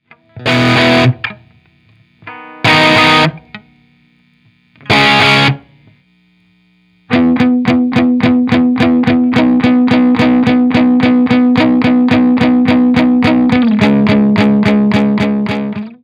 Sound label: guitar base; Guitarra